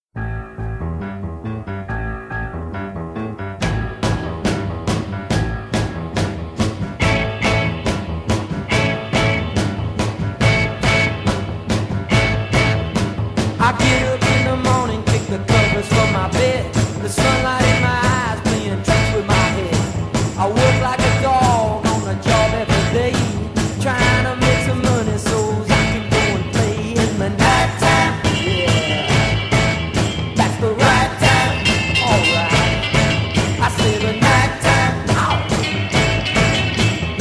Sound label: smash boom bang